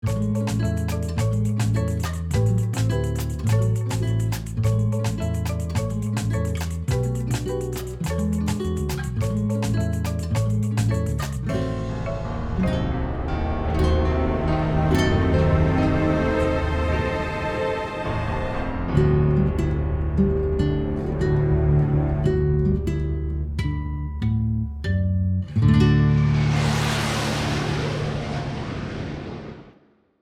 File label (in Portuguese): Jingles intros; Liberdade